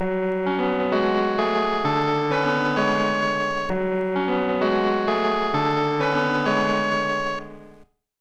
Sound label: 130 BPM F# Min Synth Keys; Melody; Loop Stems; Cymatics; 2022 Melody Collection Platinum Expansion - Pt 2; Pegasus